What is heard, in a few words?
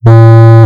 beep